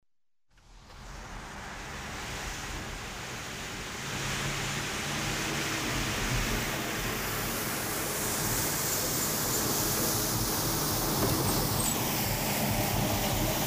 schetka uborochnoj mashiny

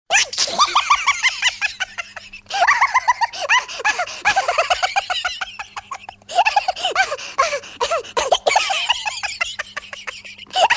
multjashnyj smeh rebenka

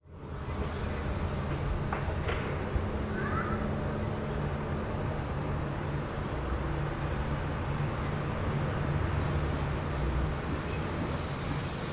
zvuk shelestjaschih listev na vetru

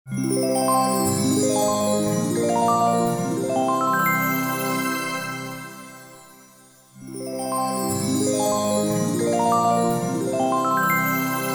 zvuk volshebstva; deda moroza

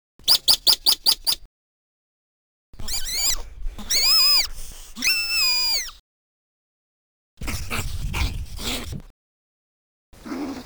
kriki zheltogo suslika

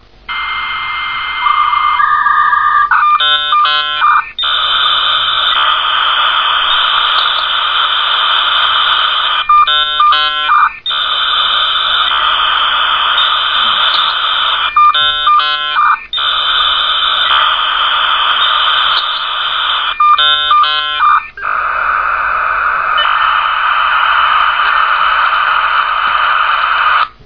staryj modem

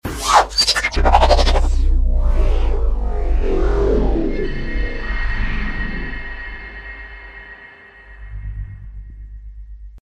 zvuk transformera

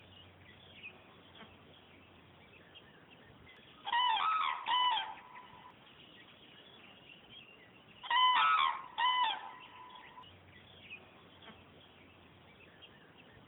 zhuravlinaja pesnja

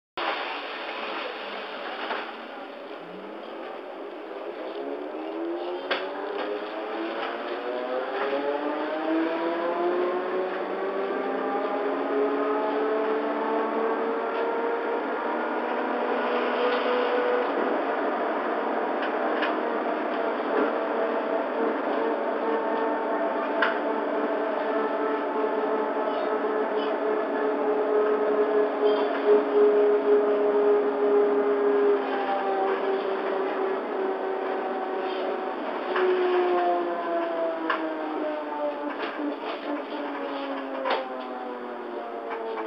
razgon trollejbusa

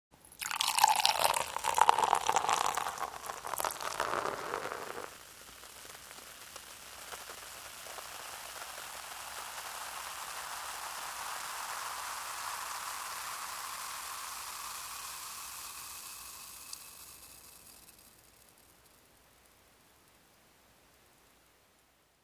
shampanskoe nalivajut v bokal

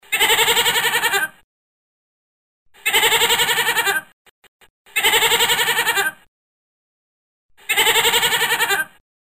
zvuk blejanija kozy

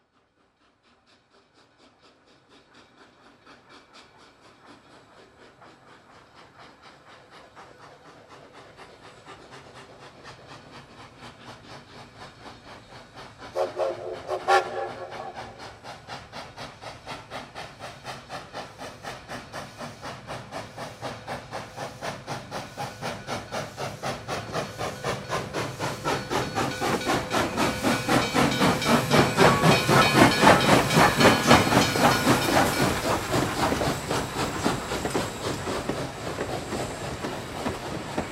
zheleznaja doroga i parovoz

